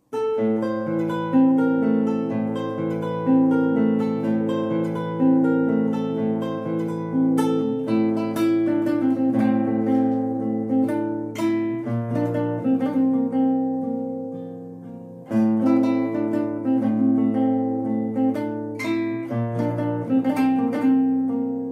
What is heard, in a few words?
Bikalam Guitar